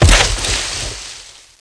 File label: smash; poke646